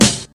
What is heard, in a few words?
smooth snare drum a key, Royality free snare single hit tuned to the A note; snare-drum-samples